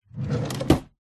household wooden bed side table drawer open modern metal runners